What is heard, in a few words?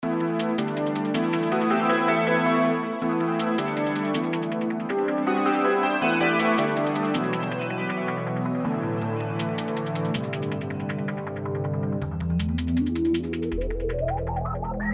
Roland GR-700; Kontakt Sample; gr300